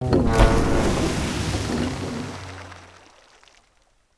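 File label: Work Boat Ancient Work Begin; Civ4 warlords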